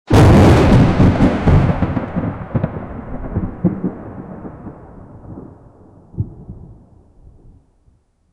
one big kra koom thunder; background

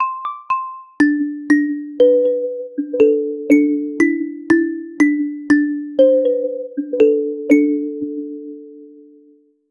a short 36 second sad com, wah wah

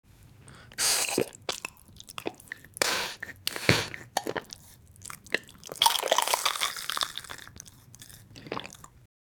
warm soup eating sounds

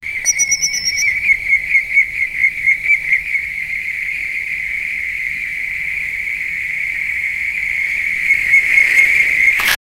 coyote; animal vocalization

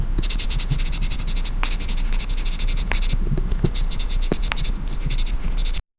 foley

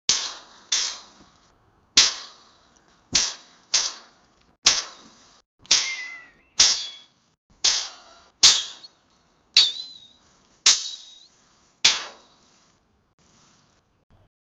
horse running sword slash; voiceover

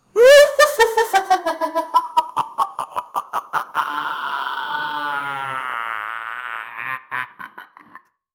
a mad old magician casts

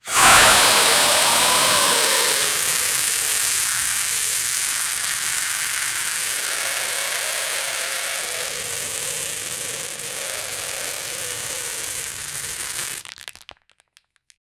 filling ballon make it bi, balloon

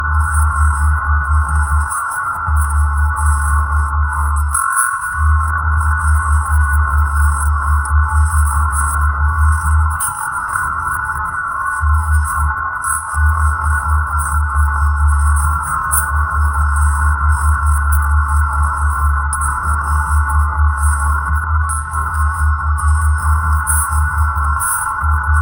balanced rhytmic mind, asmr

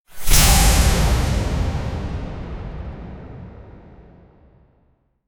magic slowly appears and; accumulation

